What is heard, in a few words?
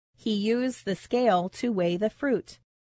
scale